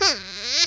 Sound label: euuh; yoshi; SM64 CO-OP